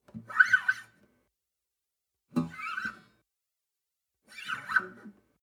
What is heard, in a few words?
western guitar slide noise